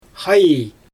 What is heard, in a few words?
throat singing; xaaj